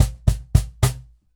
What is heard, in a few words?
Cajon Fill; musicradar